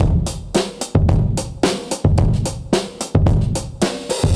arp zone; Drum loops

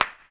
Snares, Clap n Snap

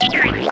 squeeze splat

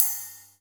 Hats Real Open

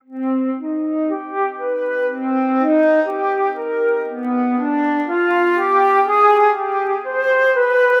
flute, Sad

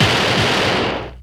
gun shot; Crash Bang Boom

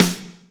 aural, snares real

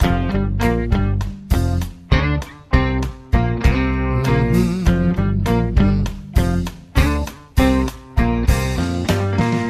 guitar

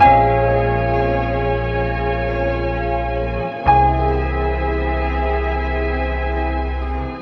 piano, Loops Sad

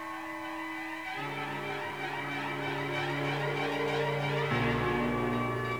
horns, Loops

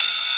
Hats Electro Open

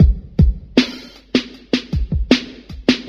type oh, Drum loops, Electro

